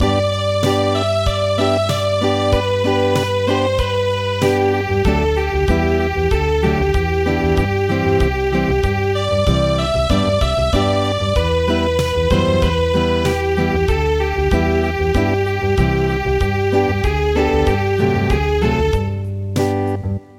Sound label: Acoustic; traditional; Long I gaze across the snow